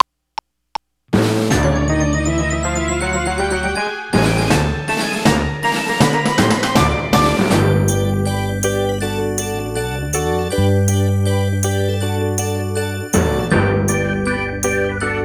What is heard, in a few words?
Inst Stem